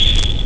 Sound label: sonar; Amiga Soundtracker Sample Packs